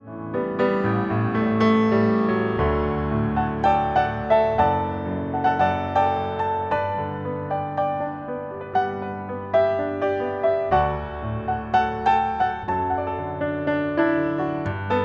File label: Piano